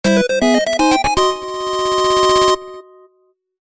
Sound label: Fanfare (8-Bit); Get The Lady's Material